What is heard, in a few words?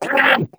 ballbot ow; player; Portal 2